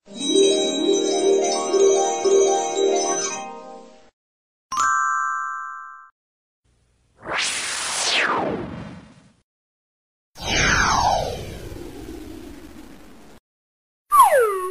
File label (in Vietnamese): Âm thanh magic